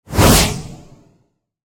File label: Rocket Launch Away, Warfare; Mortar; BlastWave